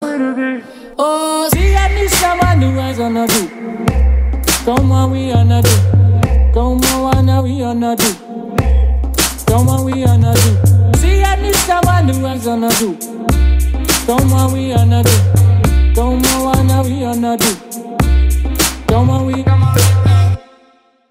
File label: Hotel Lounge